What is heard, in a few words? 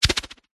warfare knife throwing weapon spin fast air